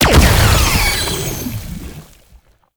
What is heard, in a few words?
Infested Lich Summon Tentacles Slam; Warframe; Abilities; Zeke